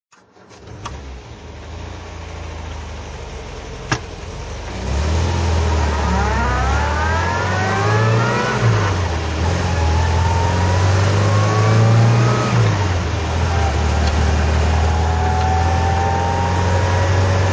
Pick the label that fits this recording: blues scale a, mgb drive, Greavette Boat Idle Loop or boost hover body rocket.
mgb drive